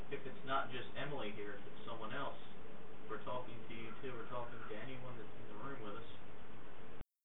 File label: Whisper; pedricktown residence